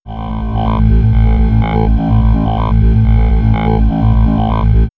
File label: Swirls, pads; Science; X-Static Goldmine